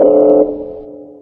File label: bank alarm loop, alarms; video game; combine; source engine; hl2